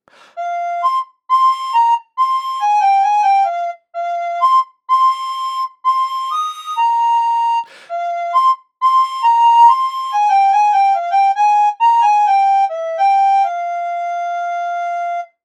Flet prosty

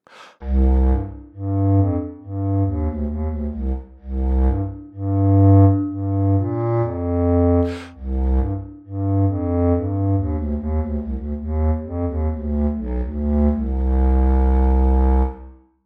Klarnet basowy

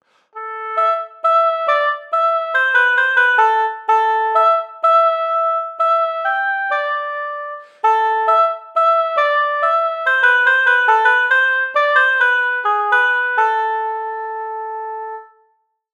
Oboj

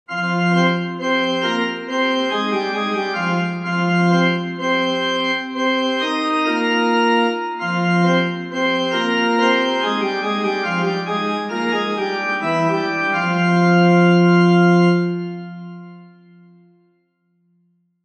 Organy

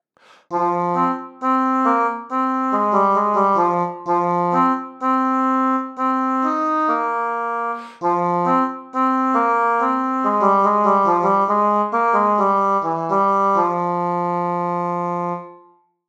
Rozek Angielski

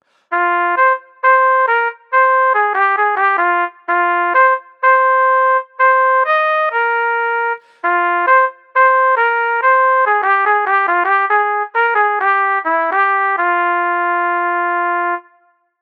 Skrzydlowka

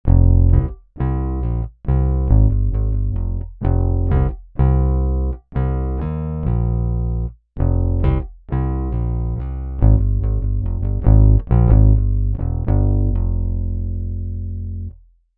Gitara basowa

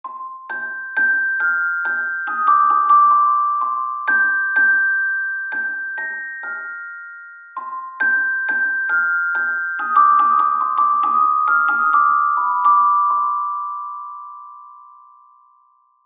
Czelesta

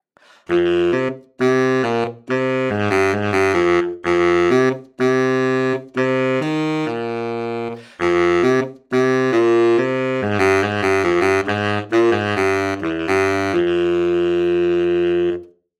Saksofon barytonowy

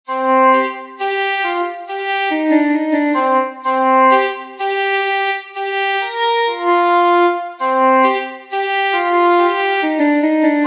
Fidel

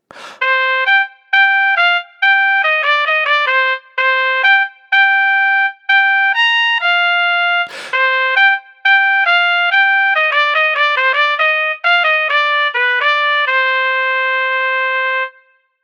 Kornet; Instrumentownik; aerofony

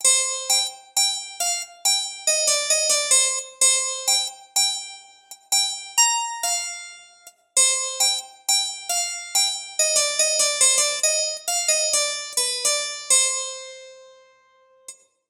Szpinet

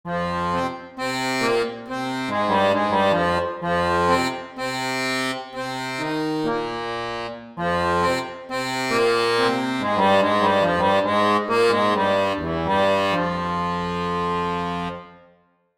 Akordeon

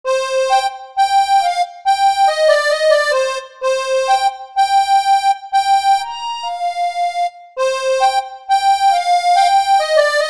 Bandoneon

